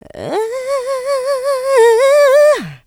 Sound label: Vocals, Adlibs; Houseworx